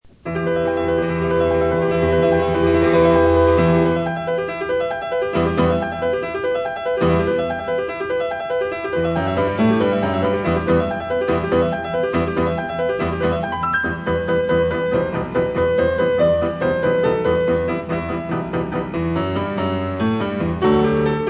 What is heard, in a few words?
piano